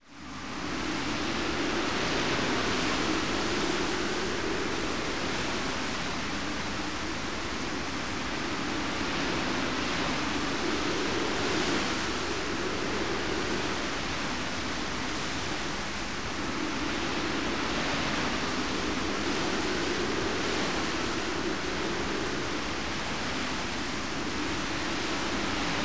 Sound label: Strong Wind; Valkyrie Profile; PS1